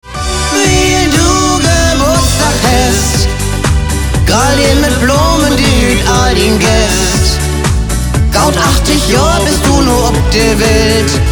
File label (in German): Plattdeutsches Geburtstagslied; zum 80; Du hest Geboortsdag